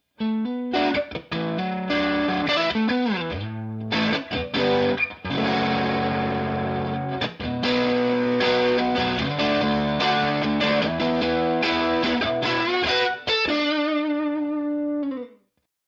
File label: overdriven